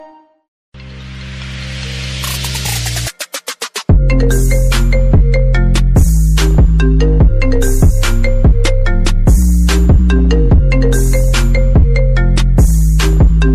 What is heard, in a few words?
iphone remix ringtone